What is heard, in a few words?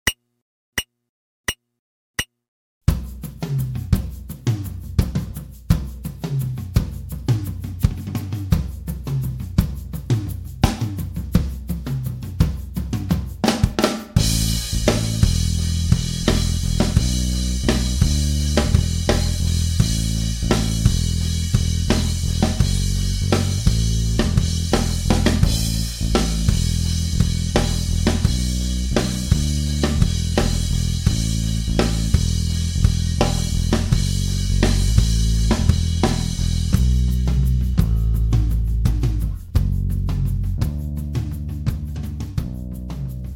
modern rock